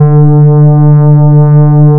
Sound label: fm cello single; FFTimbre